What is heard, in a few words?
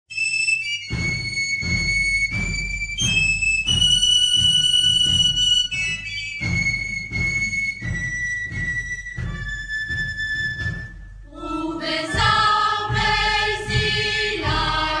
folk; couposanto